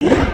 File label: run, creatures organic